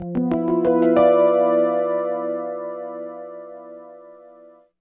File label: EP, Keyboards, Electric Pianos; Sonic Foundry (Sony Creative Software); Mac Money